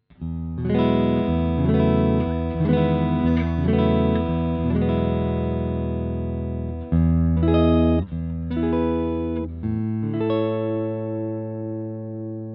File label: Chapman Chords All positions